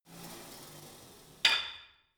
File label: pullup machine cable release weight impact; sport freizeit; fitnessstudio